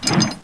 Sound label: pwrench miss; svencoop